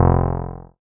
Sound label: BAS F1, SCI Prophet-5 synth bass